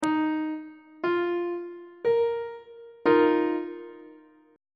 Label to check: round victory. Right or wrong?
Wrong